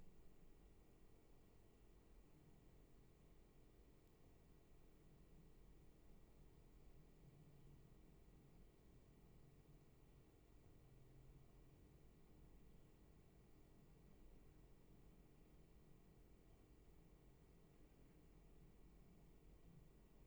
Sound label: Room tone